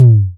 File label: Tom; Drums